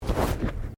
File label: Body movement Clothing Foley